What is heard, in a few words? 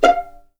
F5 PIZ MF, string; East West; The Ultimate String Collection